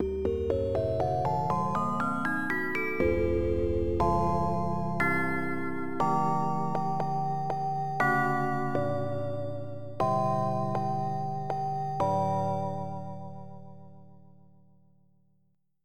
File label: Glockenspiel; FF7